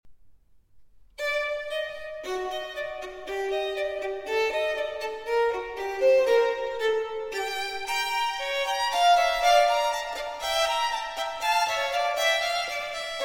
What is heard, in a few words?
Sonata For Recorder, 2 Violins & Continuo in G Minor, Fuga